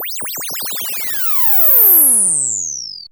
oscillator, VCS FX; Zero-G Ambient 1

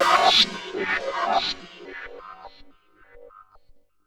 Ring Mod, Cymbal, contact mic; Murf & Ring Mod

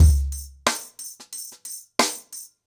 Nuclear 90BPM, ACOUSTIC DRUMS

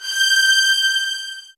G, soft violins

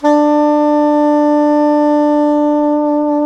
SAX SOP MF, Soprano Long; Roland LCDP07 Super Sax

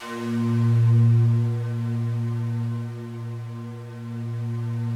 FOG ATTACK, Yamaha VP-1; Music Store; FOGATTACK 2

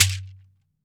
MARACA TONE

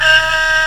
HEAGUM D#3, KOREAN

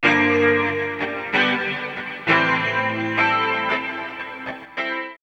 GUIT; East West - Phat & Phunky

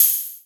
High Hat, Drum Machines

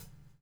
BUBL; Spectrasonics - Backbeat

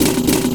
Snare Hash; Sonic Foundry (Sony Creative Software); Futurist Drum & Bass

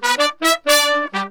BRASS SALSA; Best Service ProSamples vol.14; AKAI

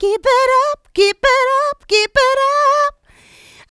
Female Vocals; Houseworx

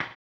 HAMBONE; Blues & Soul

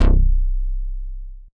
BOTTOM, SYNTH BASS

